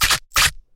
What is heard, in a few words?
Shotgun Reload; soundscrate